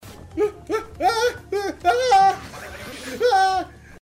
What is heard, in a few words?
wa waaaah waaaaaaaah